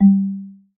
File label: Marimba, notifications; Oppo Find X5